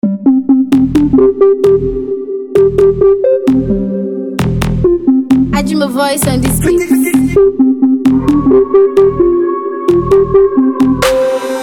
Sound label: Drums Afro Dancer Beat; Ghana Music